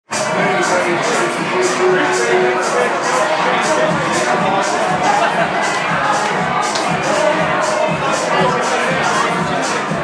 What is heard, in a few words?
quiet dinner